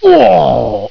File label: cop faint; Mean Cuisine